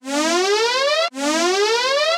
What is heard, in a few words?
warning